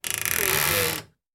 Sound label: creaky wooden closet door